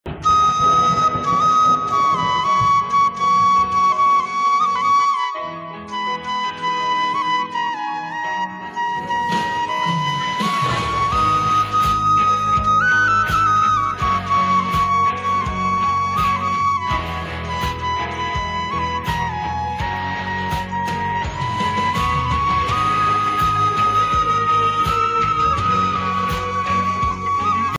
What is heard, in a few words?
Flute Ringtone Bansuri Ringtone Instrumental